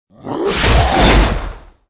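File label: attack, monster; mutroop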